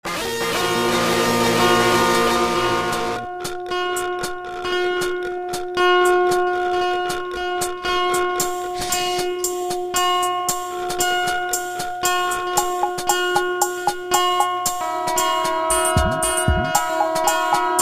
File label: Horror music